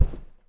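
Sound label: knock dull short timid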